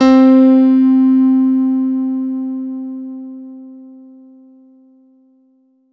C3 DANCE, ANALOG SEQ, Roland JV 2080

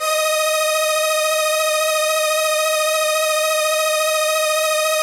SYN, ENSEMBLE

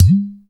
POT DRUM, UDU

